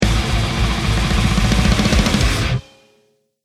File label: won round, music